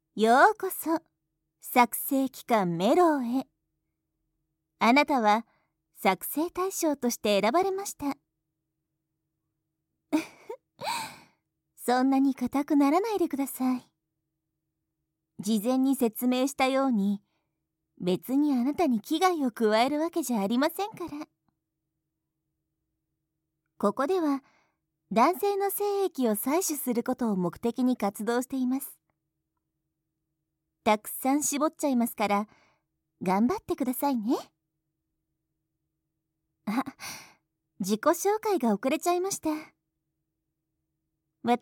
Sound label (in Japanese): 囁き 耳舐め 手コキ, ASMR